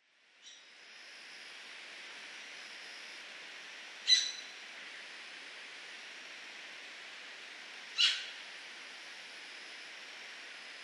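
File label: Japanese macaque; chichibu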